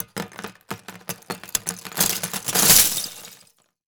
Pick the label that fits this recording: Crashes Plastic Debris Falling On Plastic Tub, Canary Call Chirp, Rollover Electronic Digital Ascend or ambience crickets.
Crashes Plastic Debris Falling On Plastic Tub